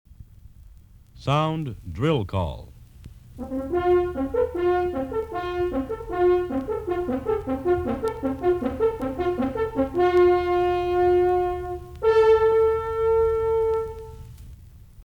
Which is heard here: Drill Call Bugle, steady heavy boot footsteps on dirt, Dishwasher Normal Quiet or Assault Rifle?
Drill Call Bugle